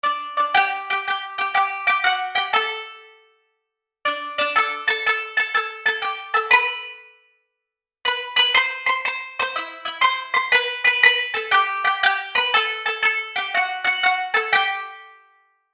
sitar

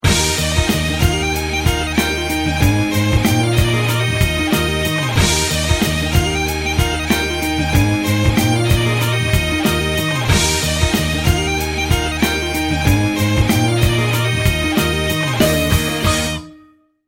Gayda

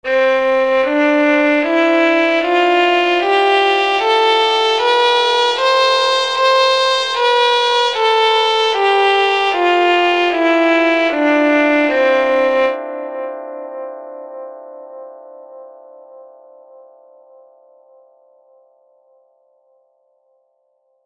kabak kemane

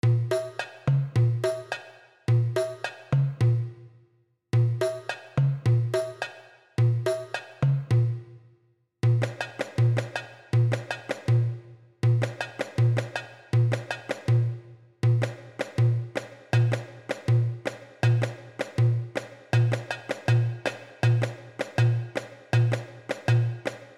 Darbuka

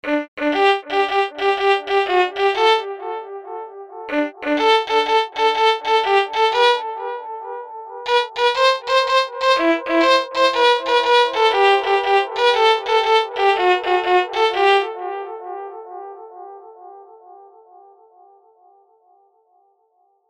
kemane melodi

